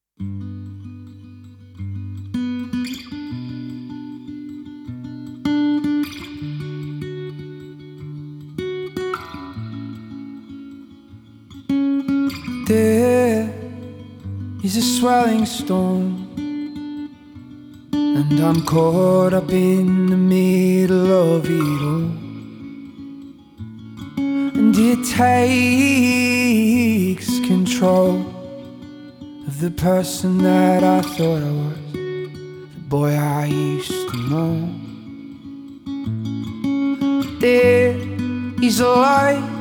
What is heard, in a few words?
Waves (Guitar Acoustic)